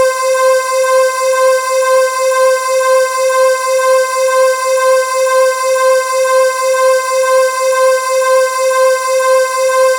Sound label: S Strng B4, Yamaha DTXpress misc voices